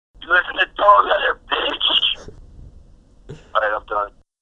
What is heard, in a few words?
Cell phone drop